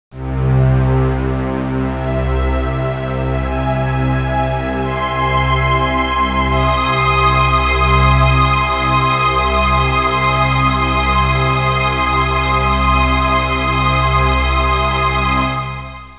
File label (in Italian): audio suonerie musica mistica